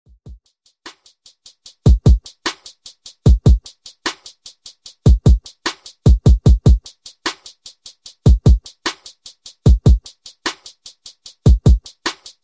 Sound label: Mixshow Intro 75 Bpm